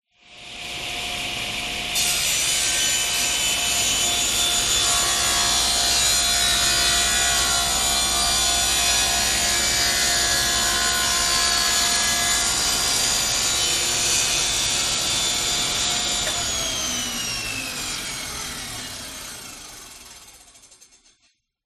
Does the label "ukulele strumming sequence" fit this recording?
No